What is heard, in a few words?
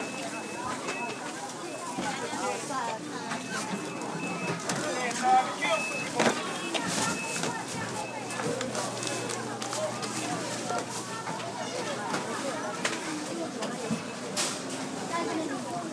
flushing supermarket